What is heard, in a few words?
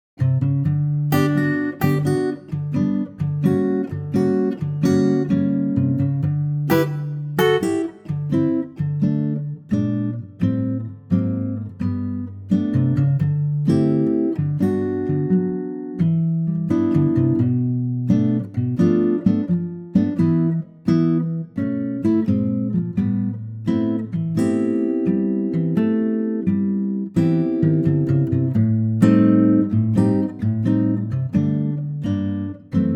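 G Ac Gtr, male; key - G - vocal range - F# to G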